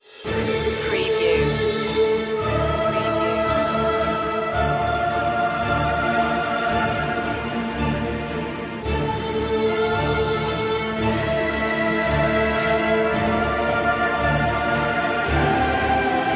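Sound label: no percussion main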